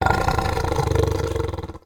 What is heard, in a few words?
growl